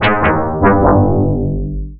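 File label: ring pattern, sequence; 10-sequence; nyquist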